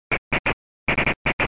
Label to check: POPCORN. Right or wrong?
Right